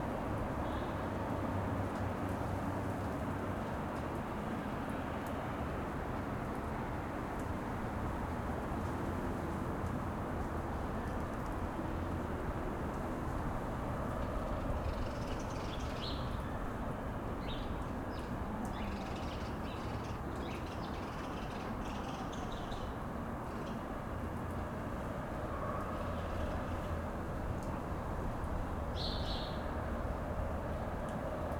QUIET STREET Wet air, wind, sparse birds; Articulated Sounds; Quiet Streets

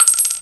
small tink concrete; weapons

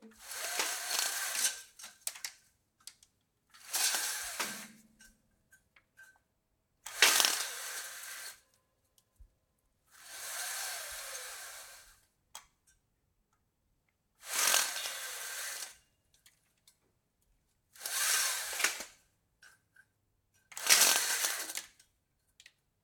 Blind, Curtains & Blinds; TheWorkRoom Audio Post

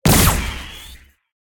Sci-Fi Weapons Heavy weapon Shot; David Dumais Audio; Sci-Fi Weapons Pack 1

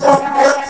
security request; hl2

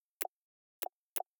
Plastic; Doex Studio; Qantum UI; UI Select

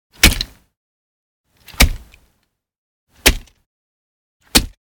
HORROR - Hammer Impact, huge; Game Audio Factory; GAFSFX01 - Dark Materials

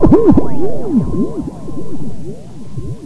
air turret active loop; hl2

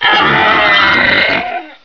bullsquid die; hl2

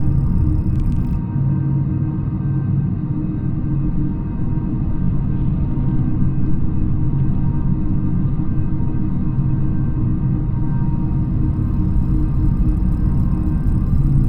amb doom drones; SoundMorph; athena

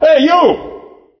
rebel scream; hl2

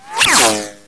near miss, weapons; hl2

